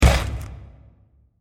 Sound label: Short Impact With Reverb; Impacts; Blasts